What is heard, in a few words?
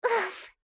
Knocked Out; Juno